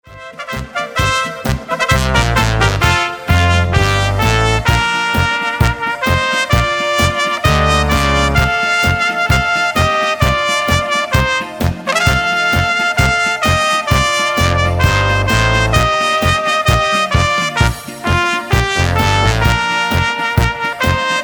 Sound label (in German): Lustige Trompete; 40 Jahre Trompetenspieler aus Tirol